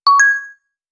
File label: new message